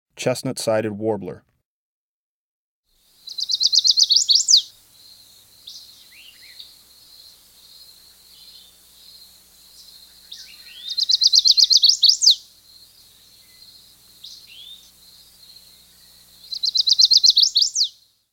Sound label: Chestnut-sided Warbler, Birds of the Chicago Region